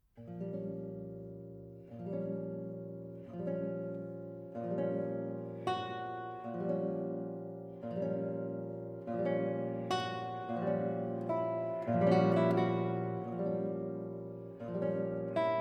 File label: guitar